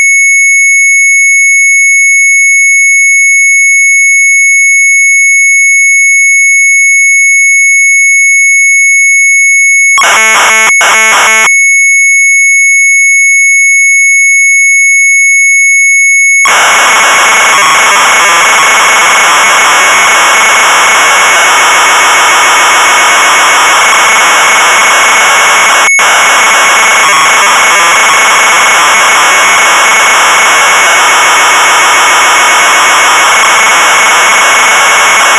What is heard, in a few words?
Sharp MZ800 Tape